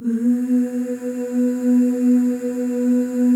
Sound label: FEM OOS, Symphony of Voices